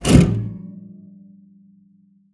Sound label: SPINET; Xsample VOL-7